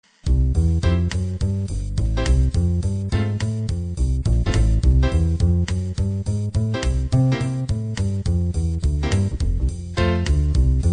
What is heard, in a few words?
bop